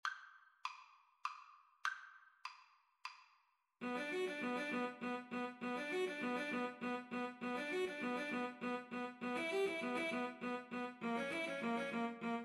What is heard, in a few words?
Cello Duet